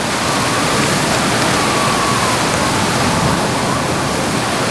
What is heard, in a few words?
plane wind; overlord